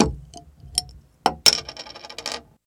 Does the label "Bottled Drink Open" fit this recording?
Yes